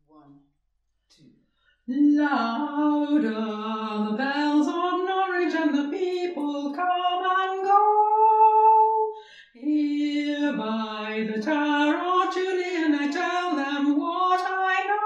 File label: bells of norwich low